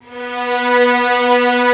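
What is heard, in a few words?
Violin Legato B2; Digital Sound Factory Virtuoso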